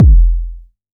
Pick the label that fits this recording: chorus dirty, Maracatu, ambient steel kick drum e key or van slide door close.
ambient steel kick drum e key